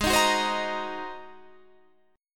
G sharp 9th G sharp

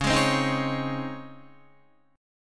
Db Major 7th Suspended 2nd Db Guitar; DbM7sus2 strummed; JGuitar

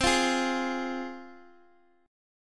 C Suspended 4th Sharp 5th C Guitar DADGAD; down; chord shape

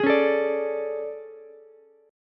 A Major 11th, down Ukulele C6; AM11; strummed; JGuitar

